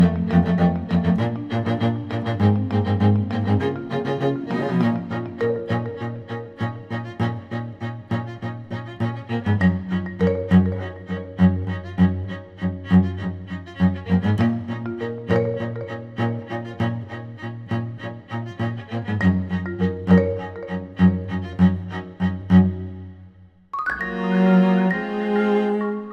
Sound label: lowkey loop 100bpm; foothills; chicory a colorful tale; ps4